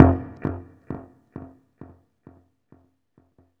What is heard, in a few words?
Distort bass single note echo; Sonic Foundry (Sony Creative Software); Ugly Remnants VOL-2